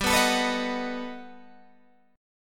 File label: G Augmented Major 9th, Guitar, Open G